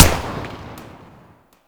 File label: Viper Fire, Weapons; Warframe; Vipers